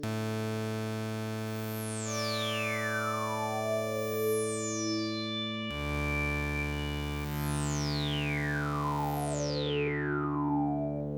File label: A drone